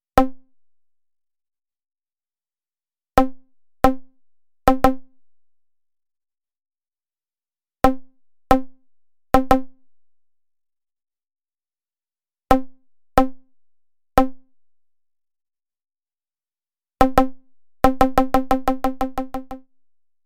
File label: 90 Bpm Attack Loop Electronic Wood